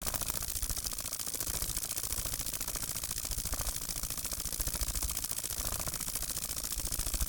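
Mutant Insects Unknown Wings Sweetener Idle, flying; Red Libraries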